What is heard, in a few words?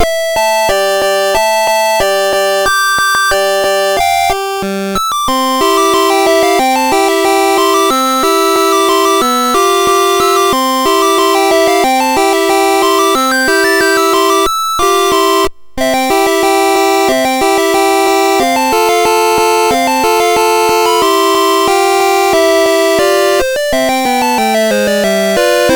Burp crack intro, sid MOS6581R4